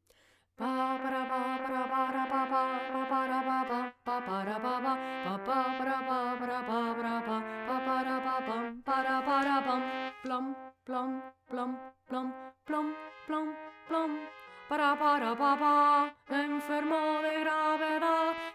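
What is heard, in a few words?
tenor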